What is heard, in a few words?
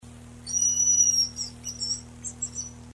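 Tetrastes bonasia; Tetraostes bonasia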